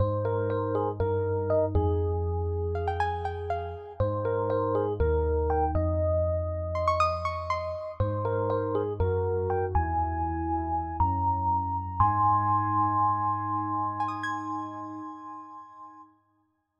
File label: jingle